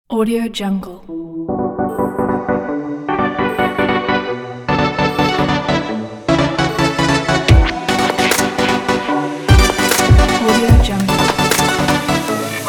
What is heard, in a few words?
a future bass music; theqlon